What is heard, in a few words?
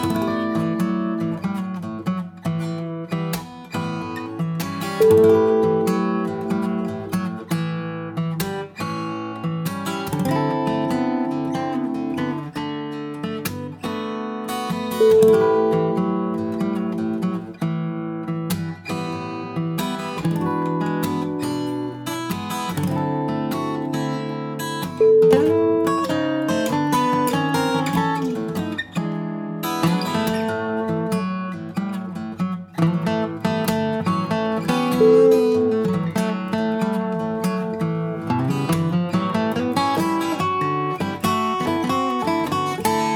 whiskey blues